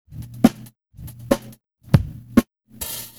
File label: LOOP FX, Big Beat Drumloops; USB Soundscan vol.25; AKAI